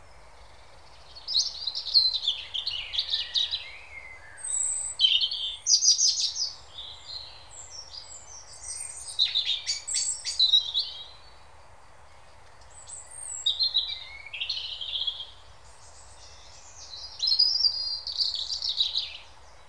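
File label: environment city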